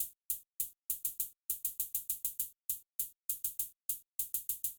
FLYING HIGH HATS; vostok inc. switch gamerip 2017

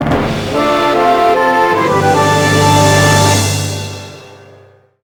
Win; psikyo collection vol. 3; Sengoku Cannon

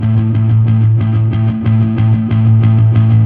Fuzz A harm

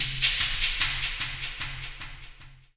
Shaker; Forest

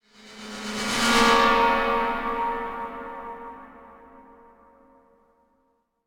bgmusic transition explore; iron marines android ios mobile 2017

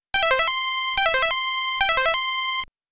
Flute; Pemo's Flute

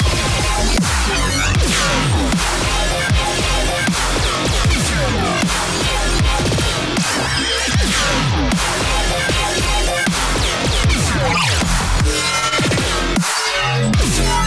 Boss Loop; Goddess of Victory Nikke

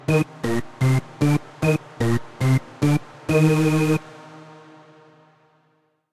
Ditty; NBA Infinite - PvP Basketball

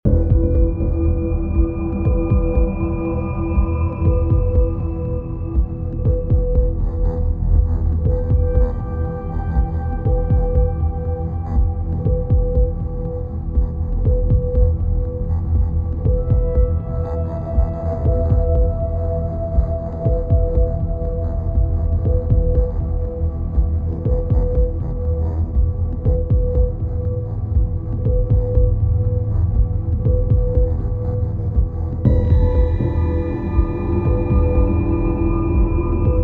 Alerting Information; Sairento VR; PS4

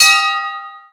bell; Spanish; water balloon

